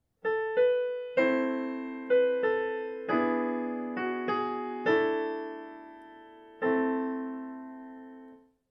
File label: Dominant 7th resolution in minor